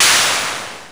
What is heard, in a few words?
noise, Sequential Circuits Studio-440